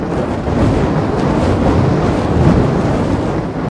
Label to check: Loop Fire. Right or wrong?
Right